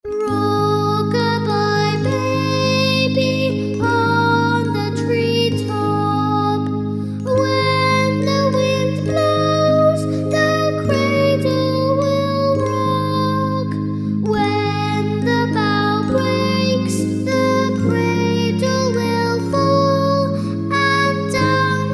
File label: lullaby, musicbox